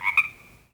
Swamp Frog Bark; Levels Hub Swamp

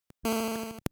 Cannot Make Move

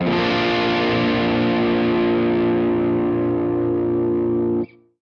guitar chord; Summer Catchers

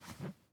tools sledge; teardown; ps5 windows xbox series xs

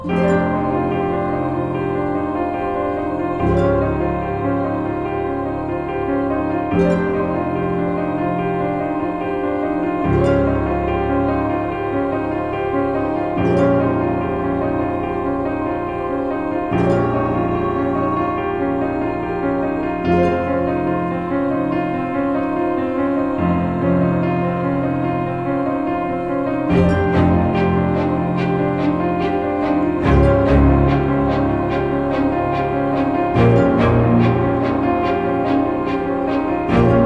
Week Dungeon Waiting Loop; Gran Saga

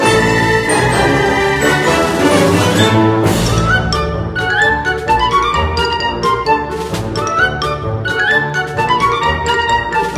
Circus Theme

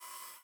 hud photo focus

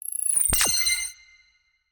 Duel Deck Entry

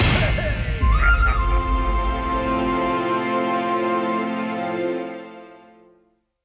Menu Screen Victory; unruly heroes

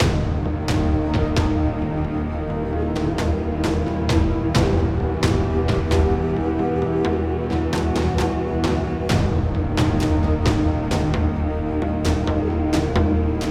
Cave Explore; skykeepers; ps4 windows xbox one gamerip 2017

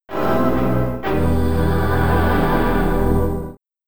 Outdoor BGM Loop End; Robocop 3; Amiga

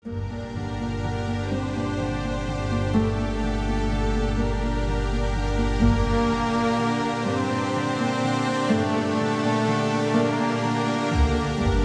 Exploration Music; the lord of the rings return to moria

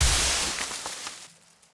foliage hit; teardown ps5 windows xbox series xs gamerip 2022

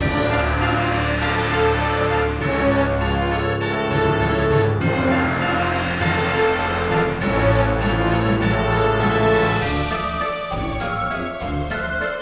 Marsh Boss; tiny guardians android ios macos mobile windows gamerip 2015

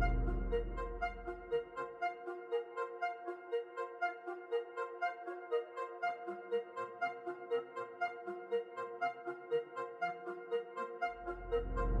Loop 120bpm; Unpuzzlr; jenny leclue detectiv

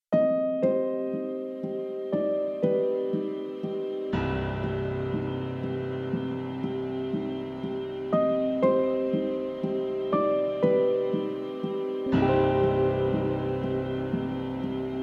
music evil

